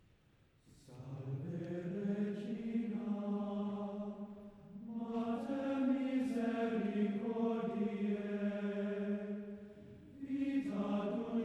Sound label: Man Choir - Gregorian - Salve Regina